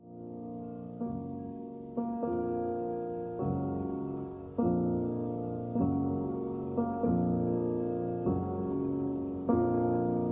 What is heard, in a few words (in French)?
marche funebre; chopin